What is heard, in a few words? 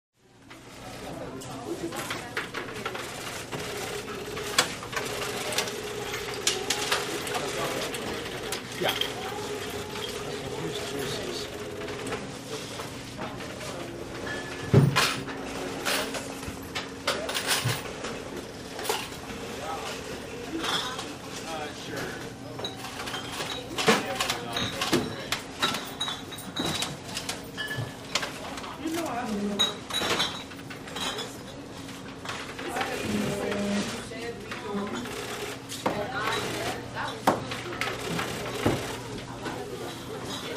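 Grocery Store Ambience, cash register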